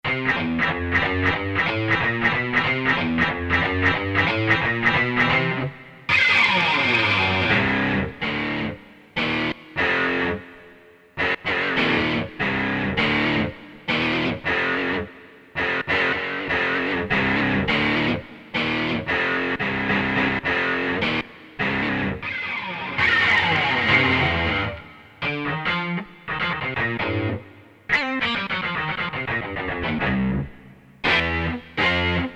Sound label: GUITARS, Guitars, Korg DSS-1; Rhythm Section & Guitars